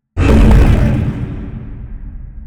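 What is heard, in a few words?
pile distant, construction; Half Life Alyx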